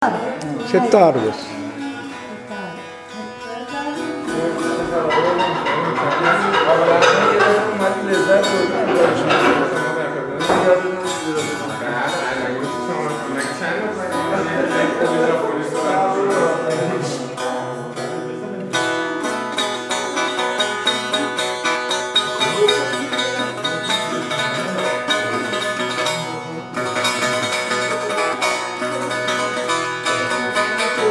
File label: mus percia cetar